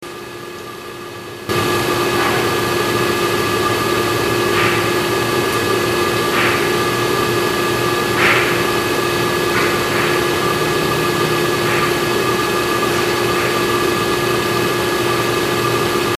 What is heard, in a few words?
banging amp; cellblock 10